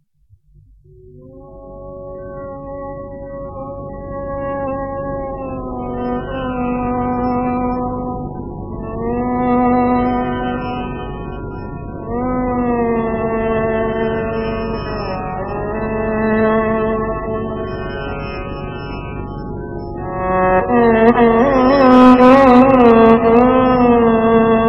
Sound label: Instrumental